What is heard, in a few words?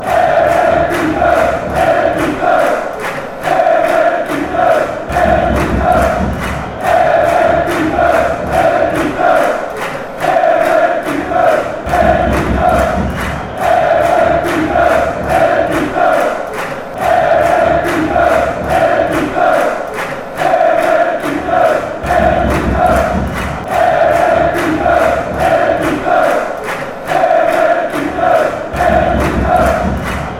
chant, fanchants; psv